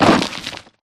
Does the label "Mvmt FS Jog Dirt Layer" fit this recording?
Yes